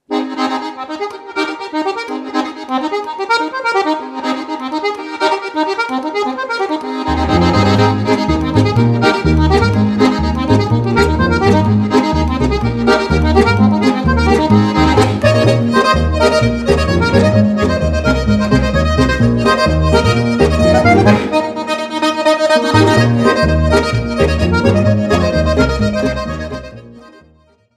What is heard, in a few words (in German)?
Marsch